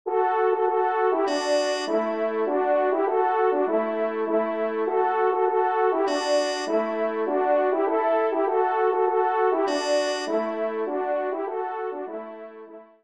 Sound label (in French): Tambourin Do Maj ENS; 3e Tambourin; Corrette